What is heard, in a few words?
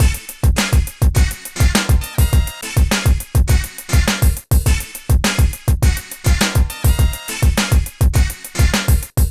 PHATT BEA, Hip Hop; E-MU Formula 4000 Series Vol. 1; Hip Hop Nation